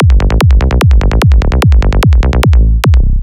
sequence kick melo 148 bpm

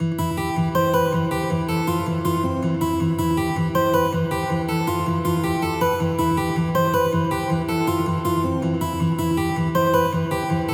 guitar loop e 160 bpm

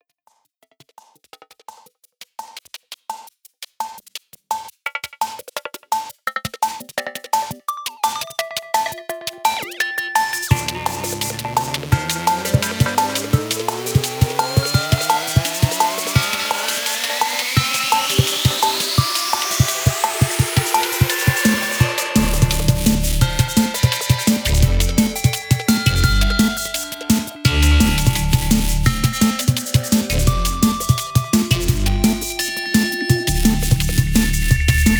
digi warp

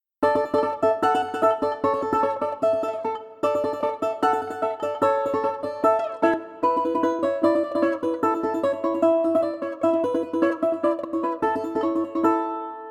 balalaika

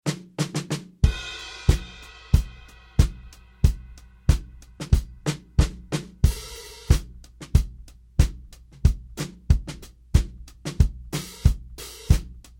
92 70s pop rock

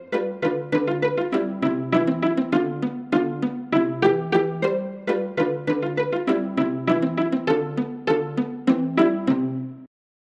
viola pizzicato

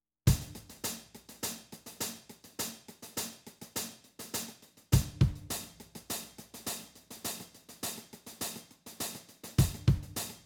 funk drums

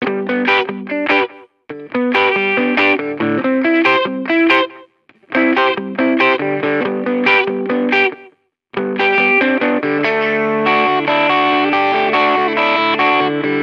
dry not effected

